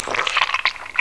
peel; mk2

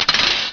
freeze; mk2; Sub-Zero

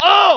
react; mk2

